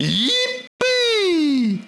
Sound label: yip